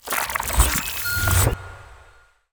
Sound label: Ice Spell Utility Levitation; Arctic Aura